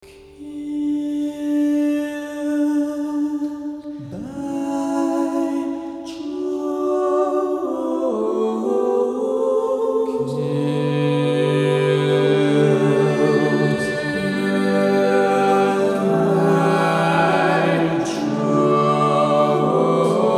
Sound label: Drones